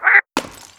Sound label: duck target; weapons